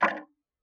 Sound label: Tick, Clocks Kitchen Clock